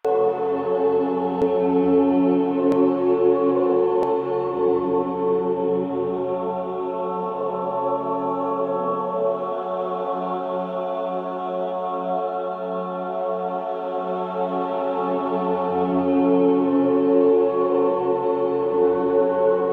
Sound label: Choir Erupt Loop; Warframe